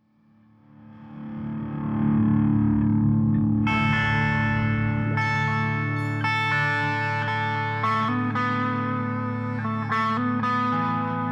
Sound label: Guitar Solo